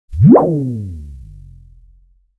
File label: sound effect anime style; fanservice